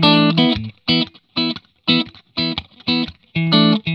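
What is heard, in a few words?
120, Vintage Blues Guitar rhythm; USB Soundscan vol.22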